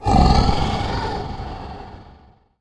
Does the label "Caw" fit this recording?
No